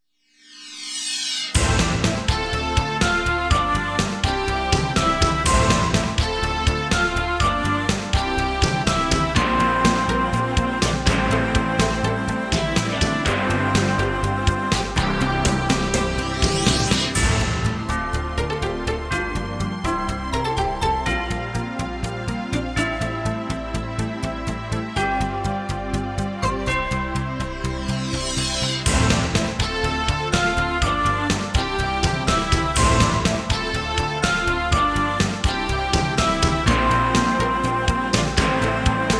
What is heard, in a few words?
Jingles; 90 DDD Jingles